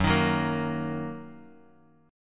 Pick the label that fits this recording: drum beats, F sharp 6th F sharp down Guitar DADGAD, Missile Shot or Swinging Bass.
F sharp 6th F sharp down Guitar DADGAD